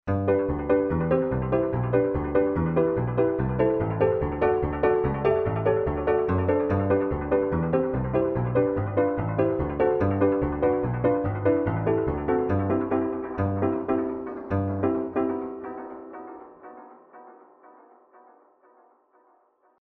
Piano

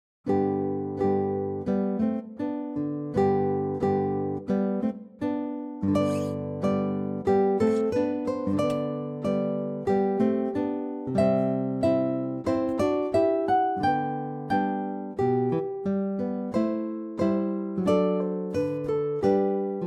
Guitar; Minuet in G Variation

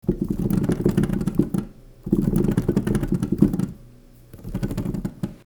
P-80 key noise

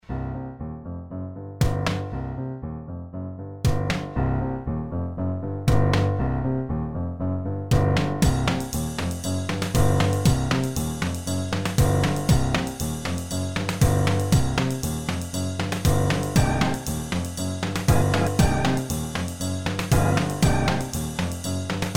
distortion

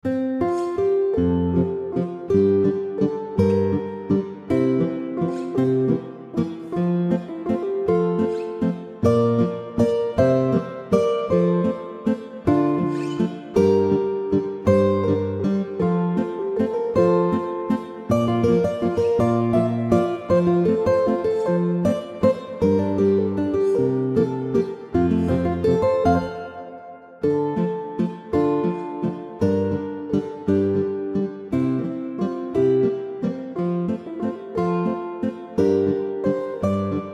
2 guitars

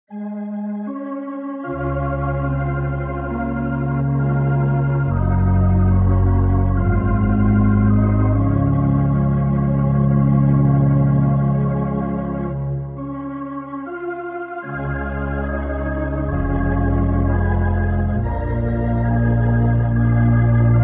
organ Hammond; Organteq

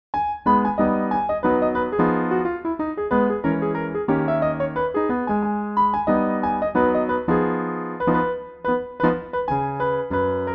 Jazzy Badinerie Bach

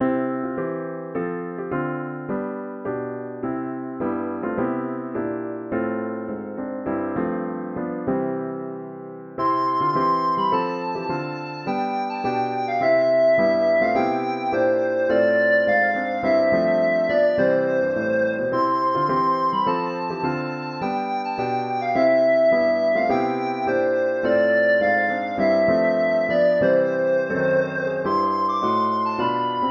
Country Garden Plus Resonance

